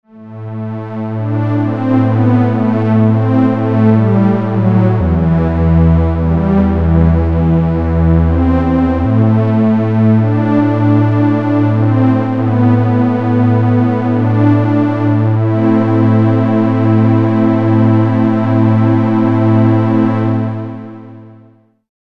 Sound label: Roland JP08 Low Strings